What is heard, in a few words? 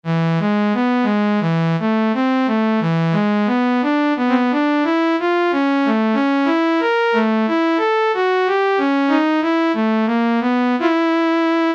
CELLO, Yamaha SHS-10 factory voice